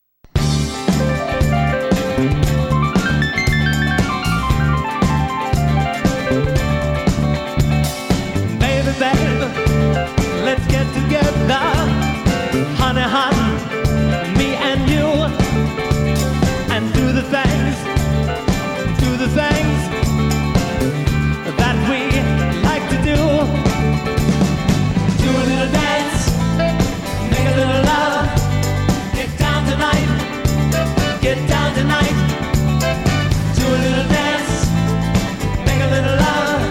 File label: disco